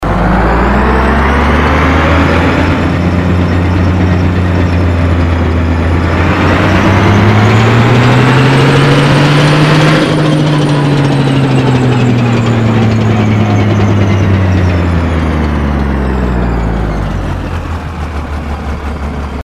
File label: Tank